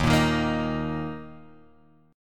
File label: D sharp 5th, Guitar, Open F; strummed; 3,5,3,5,6,x; JGuitar